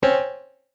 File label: Incorrect Wrong Noise